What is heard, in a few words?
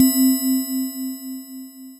Grandfather Clock, Mallets; Ableton; Factory Packs; Synth Essentials; Collision